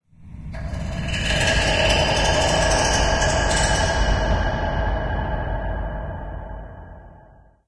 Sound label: amb click stick, ambient organic; video games; source engine; portal2